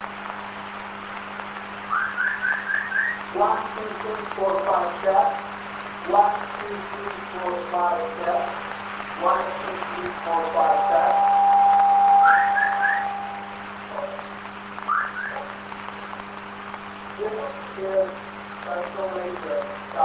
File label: spectra laser test